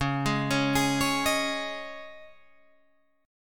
C sharp Suspended 2nd C sharp Guitar Drop D, chord; 11,11,11,13,14,11